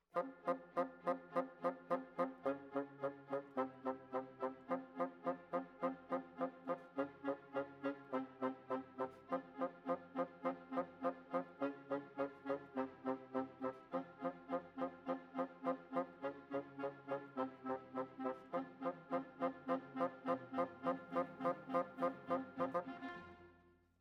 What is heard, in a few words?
Bassoon, Jazz for piano